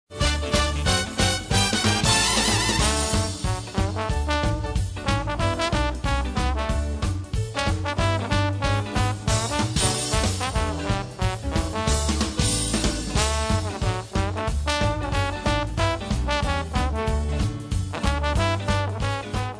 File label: Swing